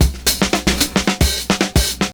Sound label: 70s Breakbeats; USB Soundscan vol.46; AKAI